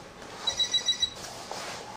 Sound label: startup